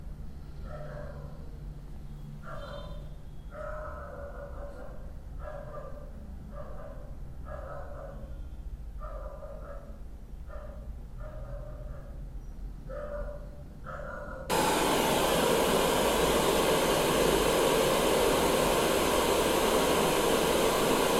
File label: Lectrofan Micro 2 white noise masking barking dog